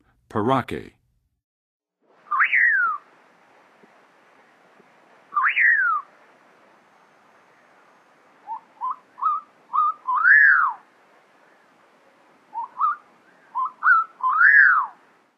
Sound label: Pauraque, Songs of Birds; North America; Western USA; Western USA Songbirds